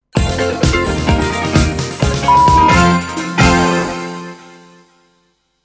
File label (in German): SoundLogo mit SoundBett 2 Toene A rockig; Soundlogos für Ihr Corporate Design